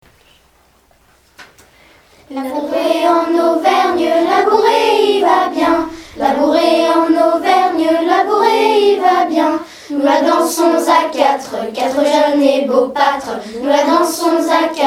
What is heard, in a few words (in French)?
La bourrée en Auvergne